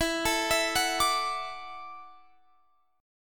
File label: F sharp Minor 7th Sharp 5th E